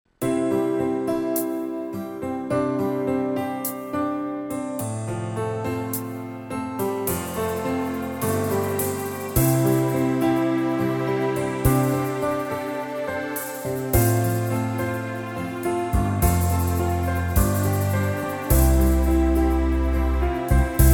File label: 8 beat